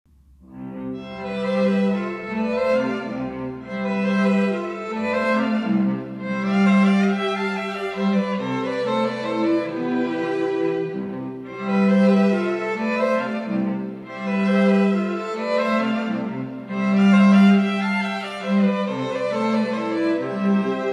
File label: Flower duet, string quartet